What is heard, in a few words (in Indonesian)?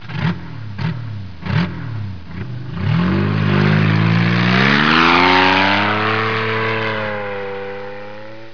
suara mobil